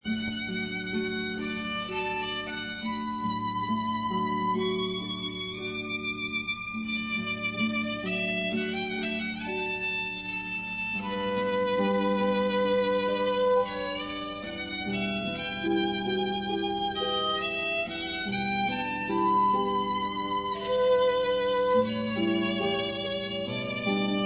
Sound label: Meditation, Harpist; Meditation from Thais